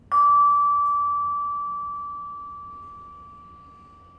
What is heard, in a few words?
two u, GAMALAN